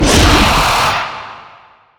fear attack

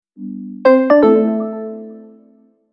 standard gong; bvg

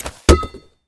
wheels

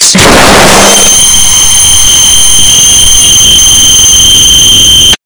flashbooom

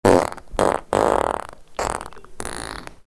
fart

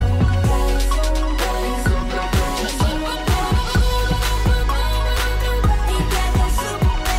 superbass

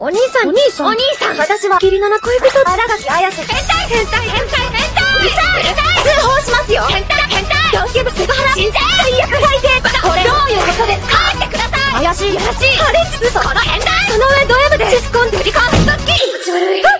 ayayayayayase

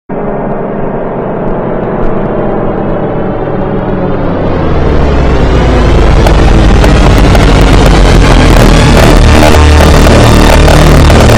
bass; thx

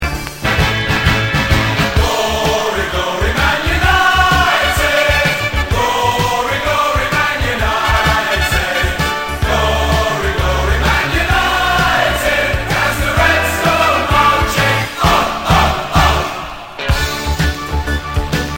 chant

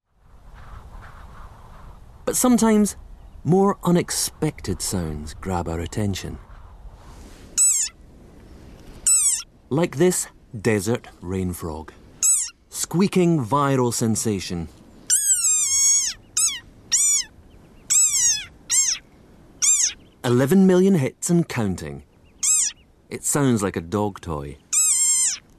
a tiny angry squeaking frog

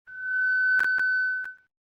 speaker

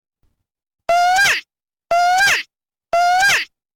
muach

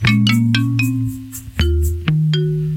marimba

